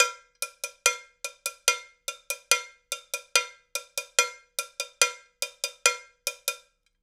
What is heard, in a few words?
Cowbell Loop, acoustic studio drums